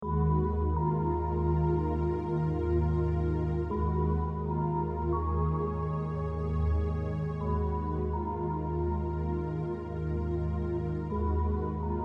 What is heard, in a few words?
trill